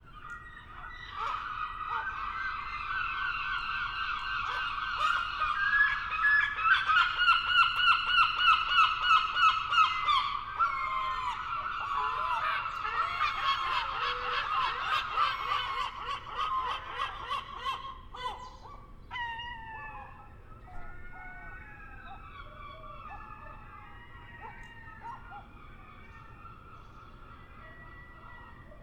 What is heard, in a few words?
Herring Gull Calling Adults